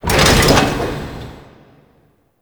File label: Skin Printing Mechanism Start; Warframe; Ambience; Entrati